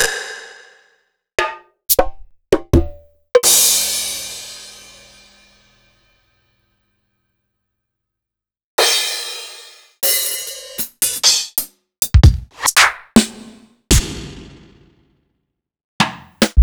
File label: Akai MPC500 Hip Hop